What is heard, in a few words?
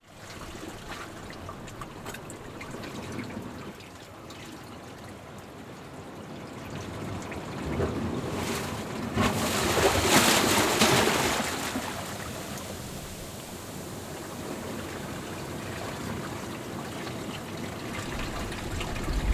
waves